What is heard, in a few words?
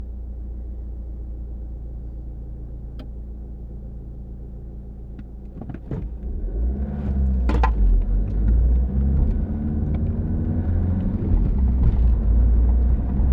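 Car Driving Short Interior, Opel Astra H CTDi 1.7; SoundBits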